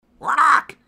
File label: Rawk, parrot